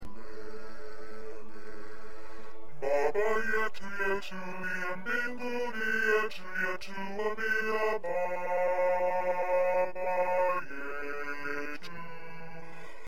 1st Bass

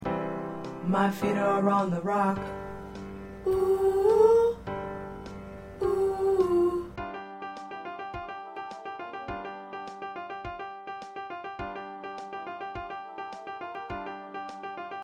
Backup

